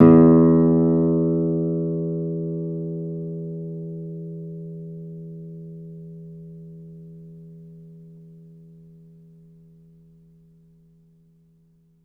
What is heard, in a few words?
Low E, Classical Guitar